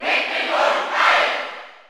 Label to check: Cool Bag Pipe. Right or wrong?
Wrong